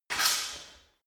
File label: sword scrape